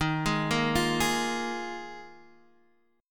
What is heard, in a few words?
D sharp Suspended 2nd Suspended 4th D sharp; D#sus2sus4 Chord {X 6 6 3 6 4} arpeggiated